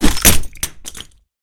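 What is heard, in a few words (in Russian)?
рассыпанное оборудование в автомастерской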